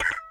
pick

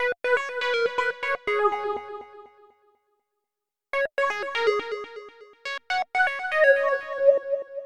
SWINGING TUNE

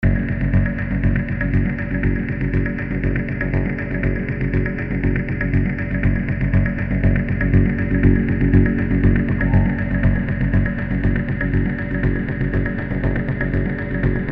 Dust Loop

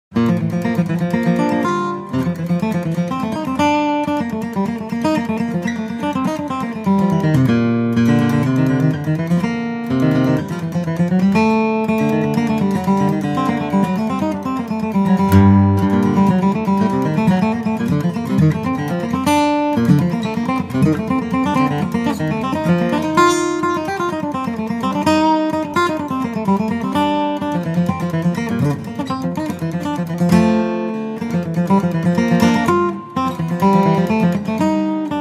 Trumpet etude on guitar; Sigmund Hering Etude #27

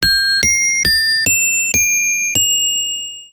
tinkle

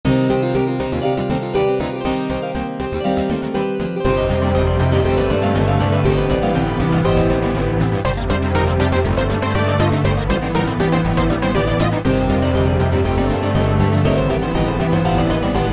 Harp Battle Song

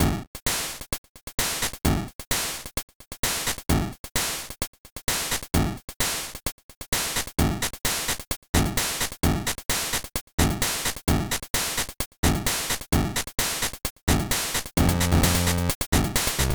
Victorious 8-bit

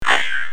attack hit

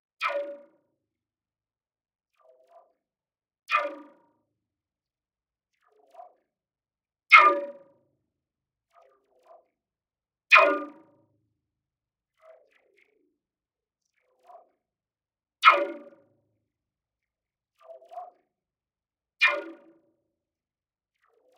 menu sounds; DKC2; Mining Melancholy

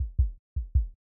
heartbeat fast

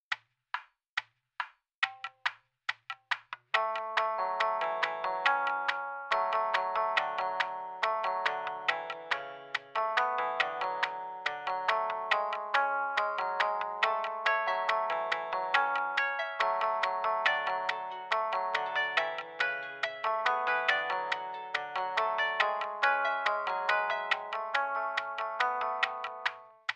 Peaceful Sea

